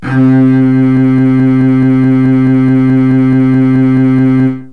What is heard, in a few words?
CELLO